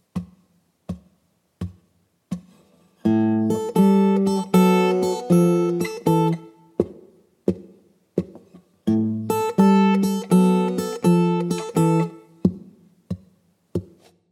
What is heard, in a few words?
Blues Guitar Turnaround